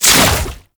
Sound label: Entangle Cast; Warframe; Oraxia